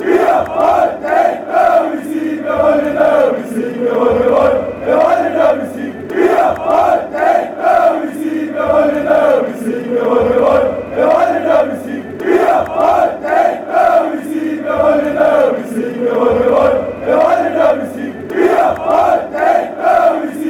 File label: derby victory fanchants